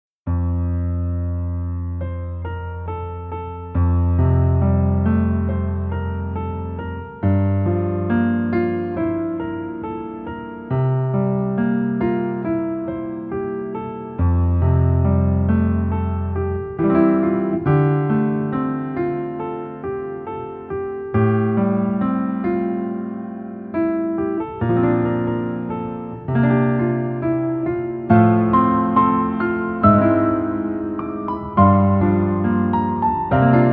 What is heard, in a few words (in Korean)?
Piano ver, 멜로디MR